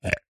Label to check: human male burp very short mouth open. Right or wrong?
Right